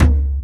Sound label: Floor